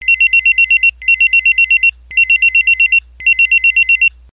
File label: pager beeps